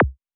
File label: click kick